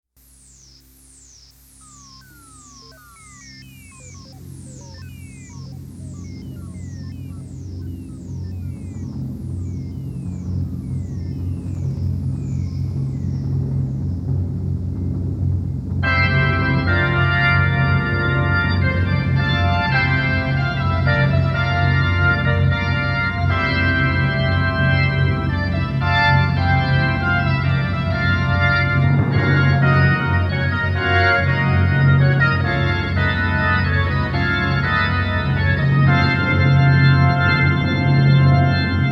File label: Fanfare